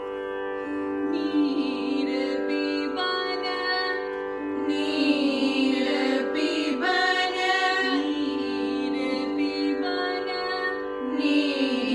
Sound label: Light Classical